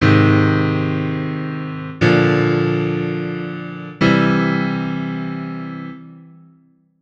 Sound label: stairs